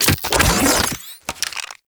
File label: Briefcase Launcher Reload C, Weapons; Warframe; Corpus